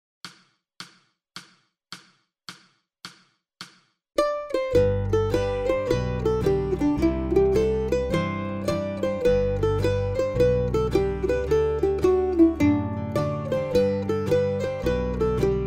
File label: Mandolin Melody Slow